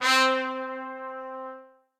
Trumpet Section Sforzando; Ableton; Orchestral Brass

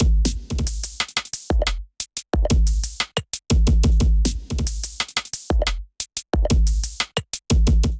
Pan Sonar Kit, Glitch and Wash; Drums; Ableton; Factory Packs

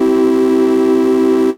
Chord, Synth Pad; Chord Pacific; Ableton; Factory Packs; Synth Essentials

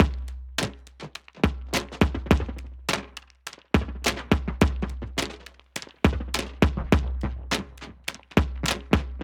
Recycled Bin Groove 104 bpm, Drums; MIDI Clips; Ableton; Factory Packs; Lost and Found

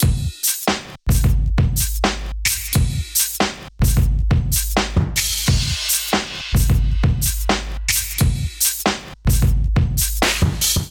Groove 88 BPM, Drums; MIDI Clips; Bang Ya Head; Ableton; Factory Packs; Chop and Swing

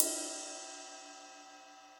Ride Multirod Edge Dry Acoustic; Drums; Drum Hits; Standard Hits; Ableton; Session Drums Studio

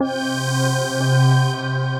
Time Chimes, Ambient & Evolving; Ableton; Punch and Tilt

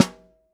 SN Top, Snare

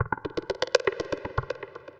Tap Dance, effects; Six80Four; Ableton; Skitter and Step

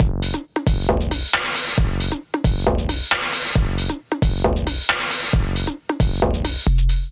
Kit Melodic 135 bpm, Drums; MIDI Clips; Trancil Exit; Skitter and Step; Ableton Factory Packs

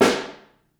SN Room; Ableton Foundation; Snare Designer

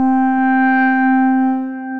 Warm Glass Piano; Instruments; Broken Keys; Ableton; Factory Packs; Mood Reel

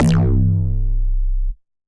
Punch Growl, bass; Ableton; Skitter and Step

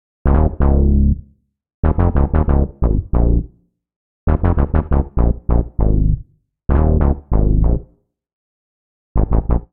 Bb Minor 89bpm, Bassline; MIDI Clips; Freak-o-saur; Ableton; Factory Packs; Chop and Swing

